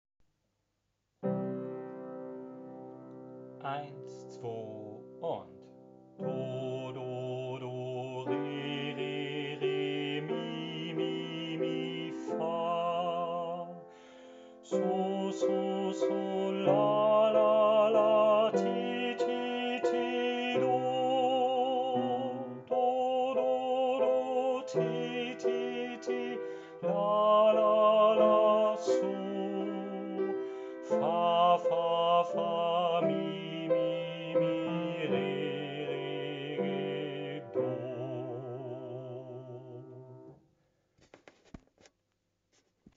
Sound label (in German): Tonleiter vom do